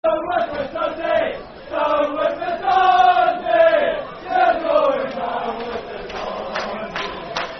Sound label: Football Chant, Dundee United; Down With The Dundee; Dundee United Dufc